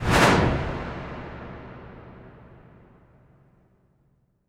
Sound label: Impact Wipe, Cinematic